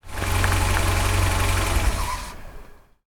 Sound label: Motor Off